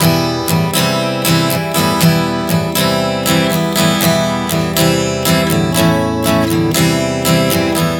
Em-C-A-C 120 bpm, acoustic guitar chord progressions